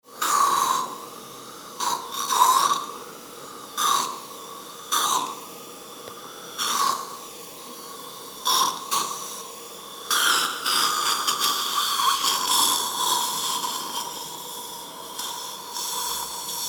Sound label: aspirator